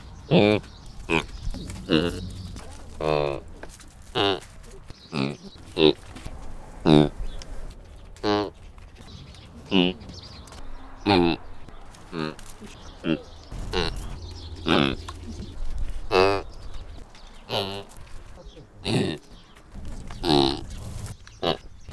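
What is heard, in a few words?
Gazella subgutturosa nasal juvenile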